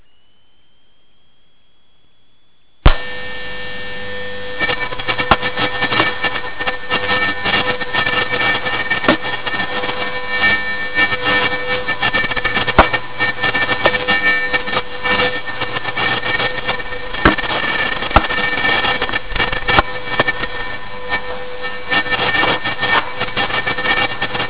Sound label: tonal lattice recording; recorded differently